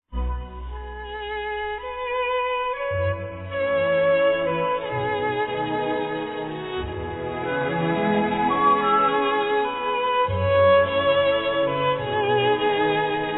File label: Violin Concerto